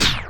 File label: Stop The Record; Loopmasters; Jon Carter & Alex Blanco; Block Party Essentials; JCB Fx